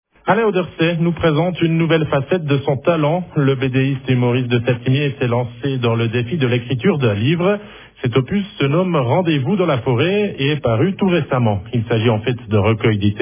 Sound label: midi